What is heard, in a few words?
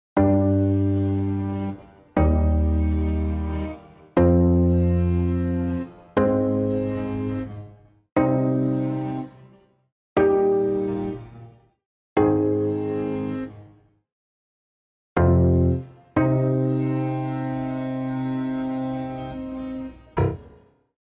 Grand; Heavyocity Ascend